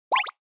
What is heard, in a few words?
Motion Eating High